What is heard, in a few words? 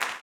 CLAP, Blues Soul; Spectrasonics Vocal Planet